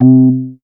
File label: MOOG BASS C4; Best Service Dream Experience